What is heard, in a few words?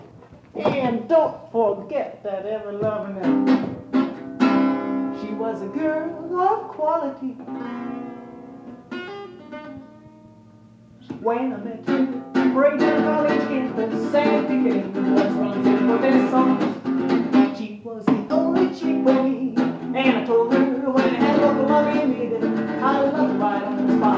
Solo